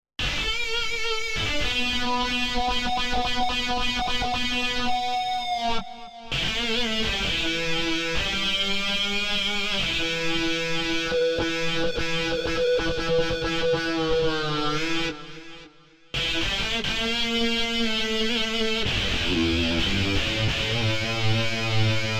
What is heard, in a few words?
Joystick Gtr